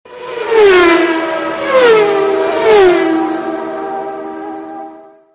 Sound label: Mobile Ringtone